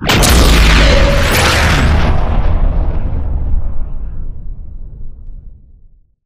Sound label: hornet right missile; Titanfall 2